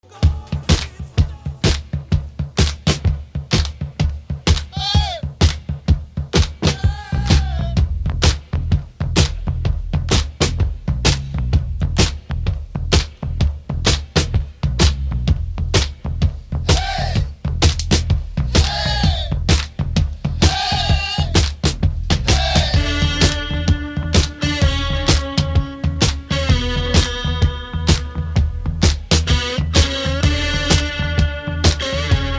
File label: Hockey Goal Song Rock n Roll